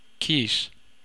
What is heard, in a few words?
kiss